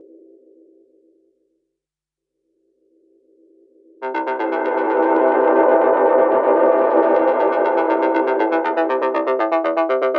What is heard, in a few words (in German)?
Moogerfooger Fx Ebbe und Flut Flux; Amazona Moogerfooger Workshop